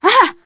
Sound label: hurt minor, teengirl survivor voice; Left 4 Dead; Source Engine